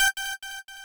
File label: lead2 g5